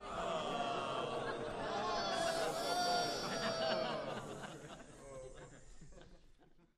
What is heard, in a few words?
Crowd Boos Hisses, small int